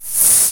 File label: Vaccum; FlyForInsanity